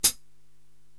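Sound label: Hi Hat Foot; Korg TR-Rack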